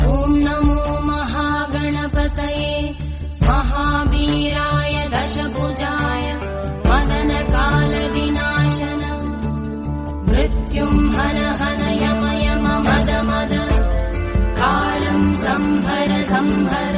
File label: GANESH MANTRA RINGTONE